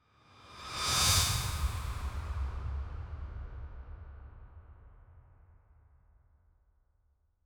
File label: whoosh swoosh swish transiti